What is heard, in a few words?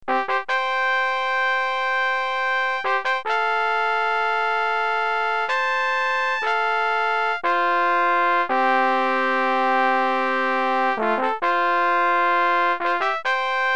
trumpet; tahorn midi keyboard